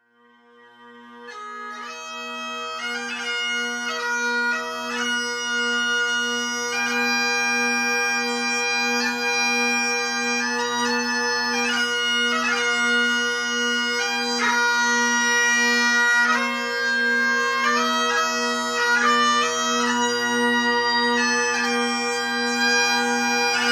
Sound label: Highland, bagpipes